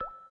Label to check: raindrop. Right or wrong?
Right